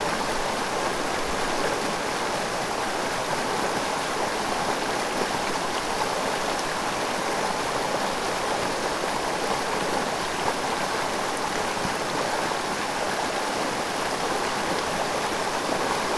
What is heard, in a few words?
river close; Rainforest Ambience